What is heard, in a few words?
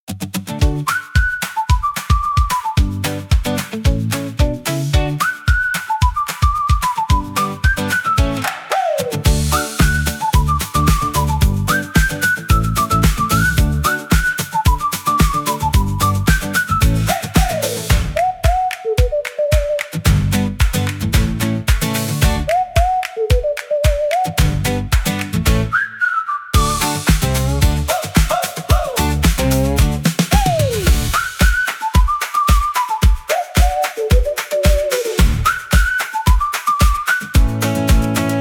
whistles, for cheerful active; morning